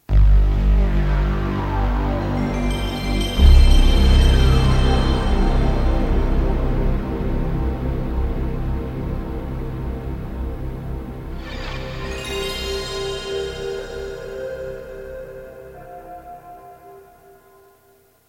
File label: play station loading sms; sony